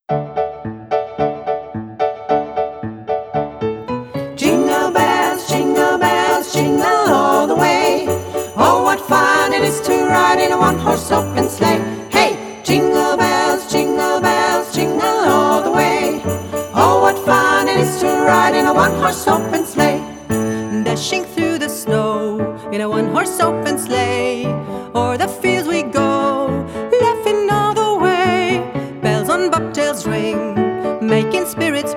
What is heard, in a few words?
Jingle Bells